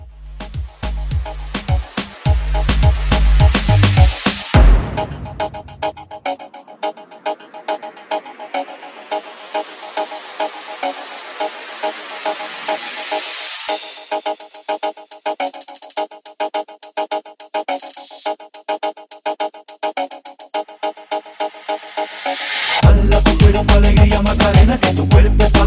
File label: Moombah Blend 105